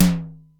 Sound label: Simmons SDS-9, Drum Machines